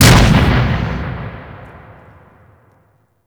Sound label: Impact Ground Slam; Warframe; Weapons; Furax